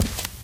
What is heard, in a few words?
walk tile, footsteps